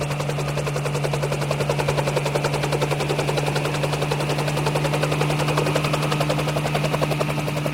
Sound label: helicopter